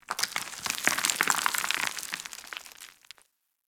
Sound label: Outpost Rock Debris; Warframe; Ambience; Corpus; Outpost Defense Two